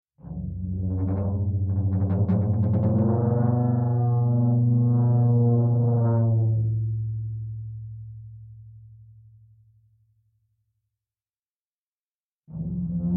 Instrument Floor Tom Drum Friction Mallet Small Vibrate; ShotgunMic; Guild Wars 2 (Original Foley Soundtrack)